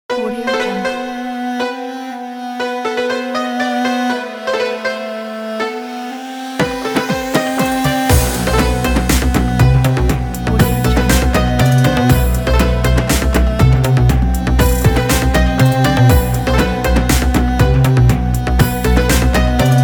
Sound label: eastern modern arabic